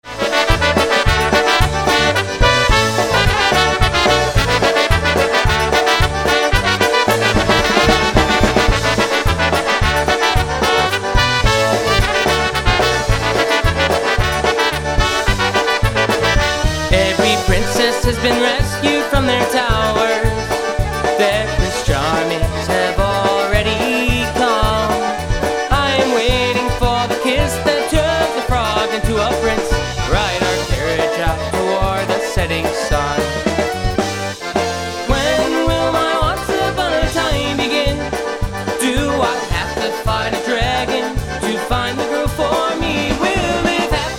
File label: Polka